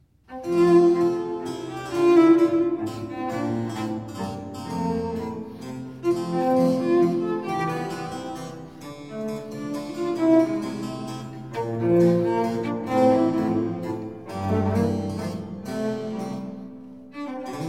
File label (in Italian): Sinfonia In Fa maggiore, Allegro, Violoncello e Basso Continuo; GB Pergolesi; Sinfonie and Sonate del settecento italiano per Violoncello e Basso Continuo